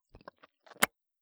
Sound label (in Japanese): ちゅぽん